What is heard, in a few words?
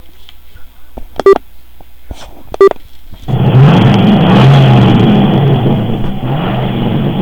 bronco